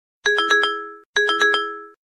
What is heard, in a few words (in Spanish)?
iphone xs max notificacion